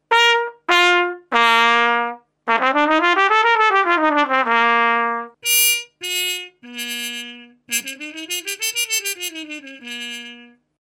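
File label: trumpet mute wah alu; faxx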